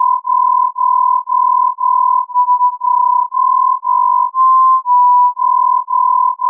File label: throb